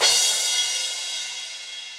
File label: Crash 16 Reso Classic, Cymbal; Drums; Drum Hits; Standard Hits; Ableton; Factory Packs; Session Drums Club